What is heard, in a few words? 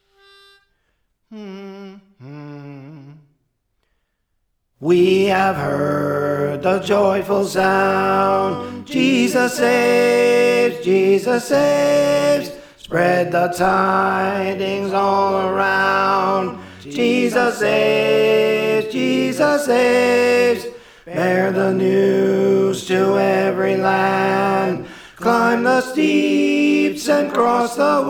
Tenor; Praise For The Lord Training